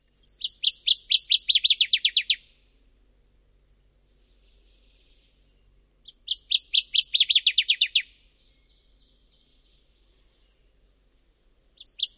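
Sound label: songs, northern waterthrush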